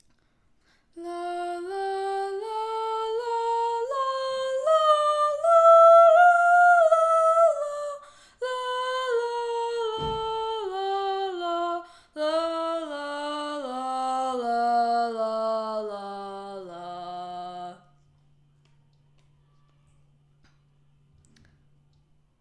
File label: Alto; allstate 2024; jrhigh; auditions